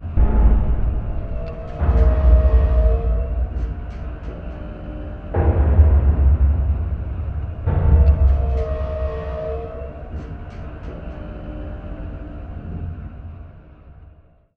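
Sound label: dog whistle idle mech distant; boneyard; Titanfall 2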